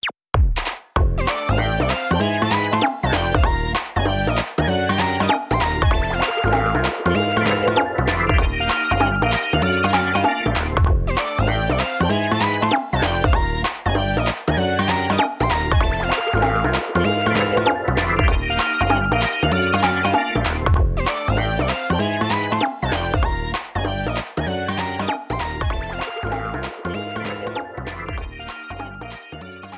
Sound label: Jingle Bingo; Taiko no Tatsujin Drum Session; Session de Dodon ga Don; PS4